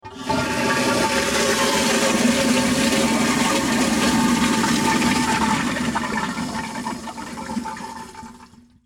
Toilet Flush

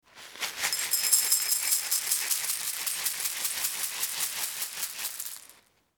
Cereal Pour

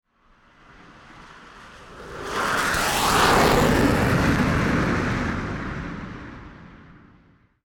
Airplane Jet Fighter Pass

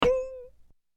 Cartoon Accent

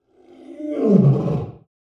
Lion Roar

